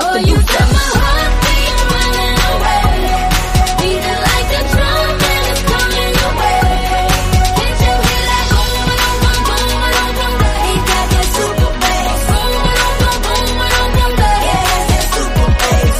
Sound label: super bass